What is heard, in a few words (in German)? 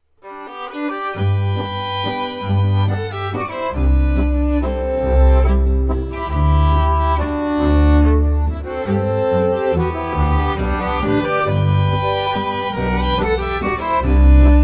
Walzer